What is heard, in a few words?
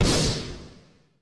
Shotgun